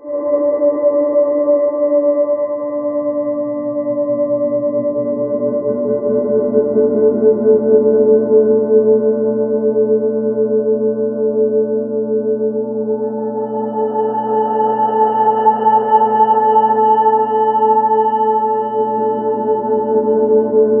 Ominous Drones; USB Soundscan vol.48; AKAI

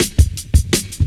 Fat Stepz Cut, Drum Loops 167.5; Zero-G Jungle Warfare 1, 2 & 3

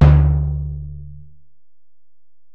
Bell, Alesis DM-PRO

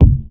BD, B. DRUM, House Side Of 2 Step; USB Soundscan vol.51; AKAI

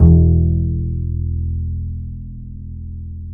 E 2, Bass; Spectrasonics; Bass Legends

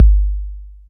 808 kick, drum machines

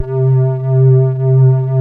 SYN SQRS; Pro-Rec - Synthsonic

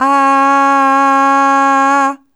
Aah Short C#3 Dry

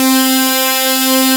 Aud Synth C4; Digital Sound Factory Xtreme Lead

